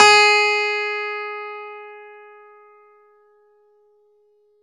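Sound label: clavinet; Roland LCDP10 Keys of the 60s and 70s 2; Clav DB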